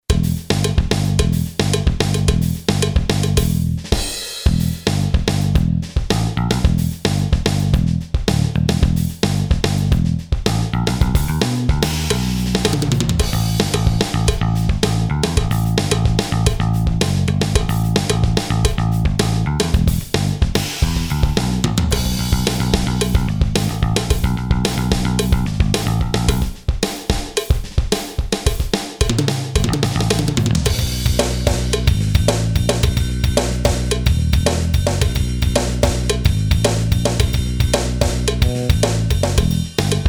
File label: FUSION, Boss DR-3